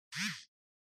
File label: Cell Phone Vibration Hand